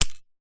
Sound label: mouse trap snap set off; animals